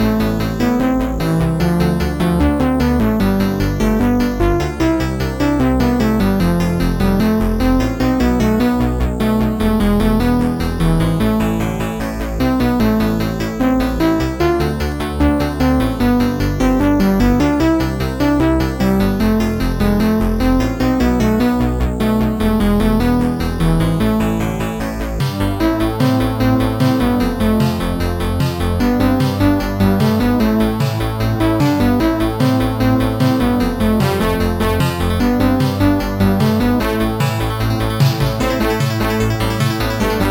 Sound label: brass tune, mod, Trackers; Modland; brass tune (final)